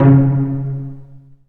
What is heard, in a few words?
Cel Pz C#1; Digital Sound Factory Virtuoso